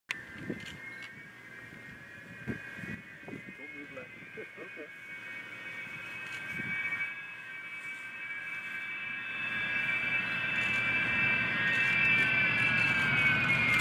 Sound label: Ilyushin Il 62